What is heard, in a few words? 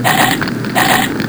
flying skull, spells; wizardwars